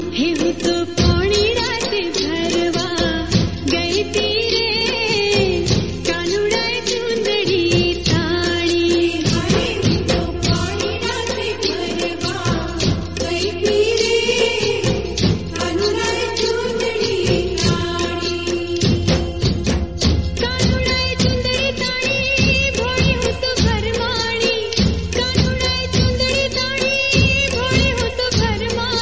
Pure Gujarati Tone

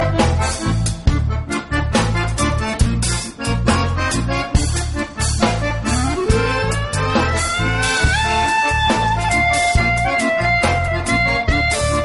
Nokia Ring Tones

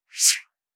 Alcatel Email Sent

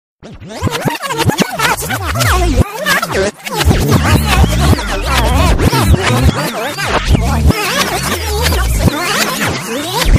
Fast Forward Tone

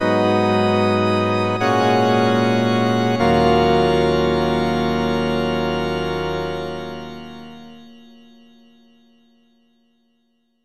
Organ

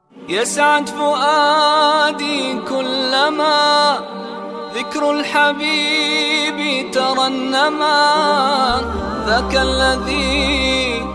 islamic

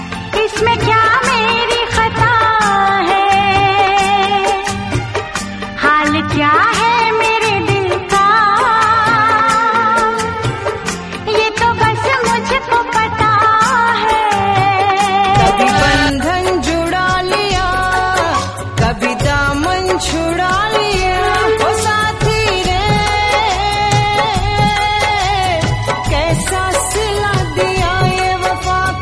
Dj Mix Bass

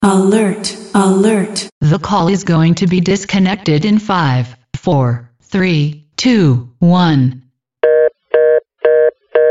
Call Disconnect

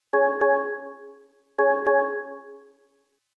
Bip Bip Poly

